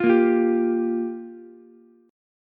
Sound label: G Minor 7th, Ukulele; Gm7 strummed; JGuitar